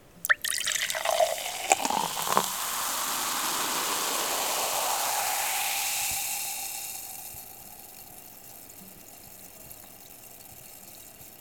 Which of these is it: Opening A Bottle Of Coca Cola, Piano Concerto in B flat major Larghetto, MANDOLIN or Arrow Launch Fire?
Opening A Bottle Of Coca Cola